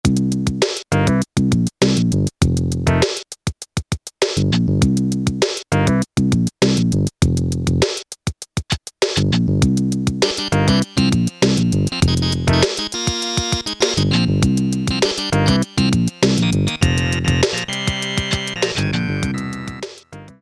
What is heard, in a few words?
RAP 2, Casio Rapman rap patterns